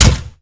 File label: wall wood; Golden Crayon Sounds